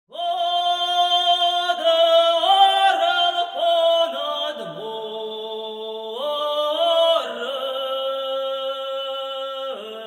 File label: Folk Choir; Cherkasy